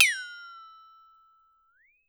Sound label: Flexi Down, Percs